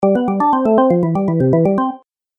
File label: Organ Game Music; Cartoon Music Sound Effects